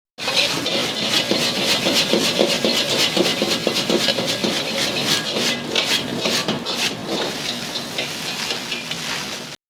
kakimaze

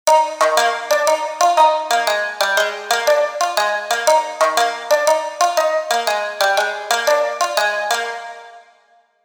sanshin

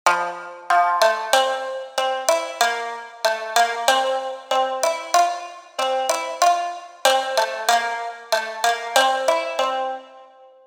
sanshin

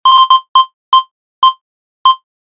jyojyoro

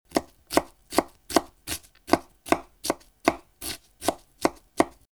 manaita

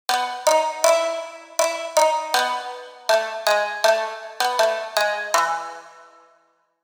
sanshin

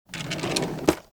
hikidasi

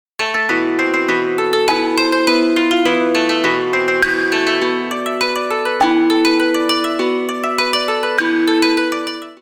koto

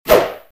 suburi on

